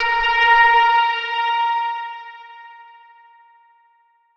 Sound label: chime; chime sharp